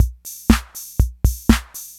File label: INTROS FILL, DRUMS PERC YAMAHA; Rubber Chicken Software Sample Bank